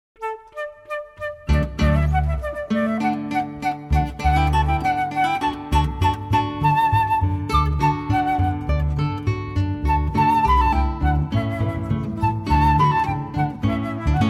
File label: La Rejoussance, guitar flute bass trio